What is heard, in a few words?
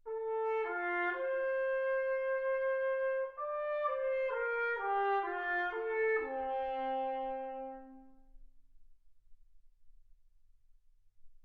trumpet